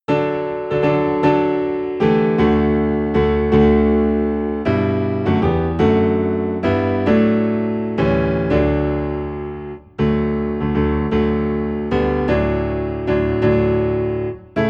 Piano Low in D; More About Jesus

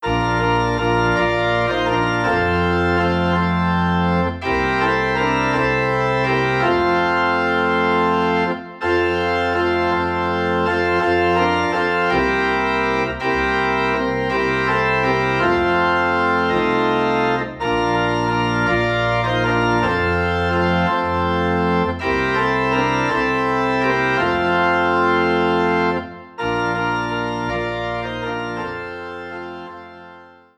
Organ in F

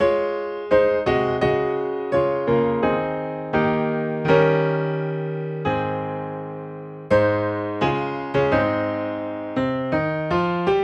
Piano in A Flat

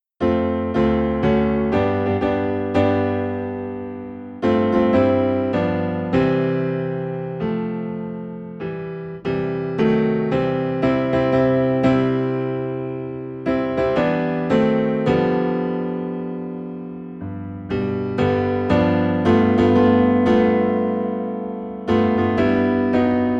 Piano Low in C

